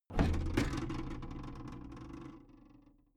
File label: big plastic case lid lift medium